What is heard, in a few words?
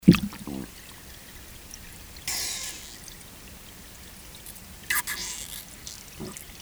White Sucker, soniferous fish